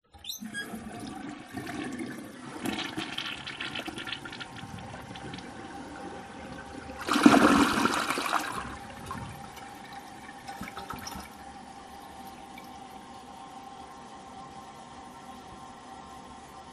toilet flushing with squeaky plunger rattling plunger chain audible toilet; omar alvarado